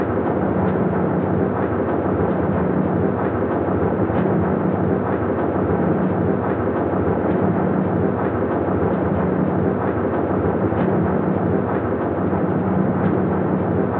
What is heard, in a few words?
control rod; The Swapper